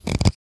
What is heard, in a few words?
Potion bottle cork stopper open